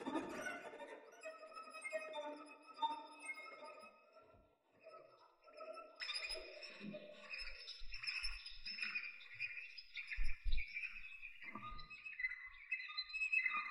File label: Train